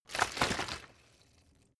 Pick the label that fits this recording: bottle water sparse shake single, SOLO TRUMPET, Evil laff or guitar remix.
bottle water sparse shake single